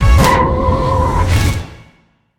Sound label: Activate; Smite; god Izanami